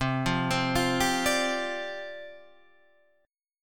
C Suspended 2nd Suspended 4th, down, Guitar, Half Step Down